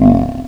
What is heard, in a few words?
roar; goatgame